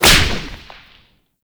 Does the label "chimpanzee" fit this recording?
No